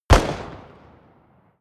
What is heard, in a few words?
rifle distant shot; comedy-cartoon; pistolen-gewehre